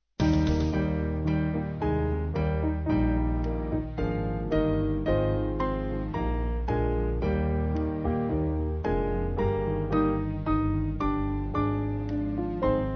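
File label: Band, Music Backing; Aurelia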